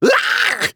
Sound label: Tesla hit